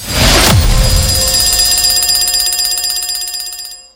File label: impact, jackpot; wbg games